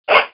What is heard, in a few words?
zip fast